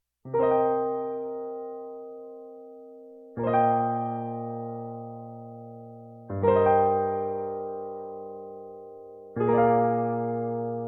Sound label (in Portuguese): piano; Romance de Juliana e Dom Jorge; 15 canções capixabas